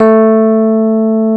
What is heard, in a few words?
A 4, Electric Pianos; Wizoo Electric Pianos